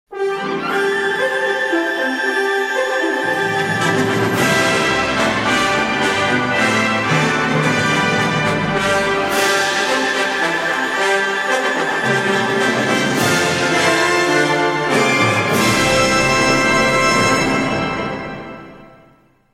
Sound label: Majestic Fanfare